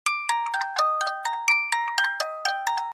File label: Music Box; Moon Candy; Five Laps at Freddys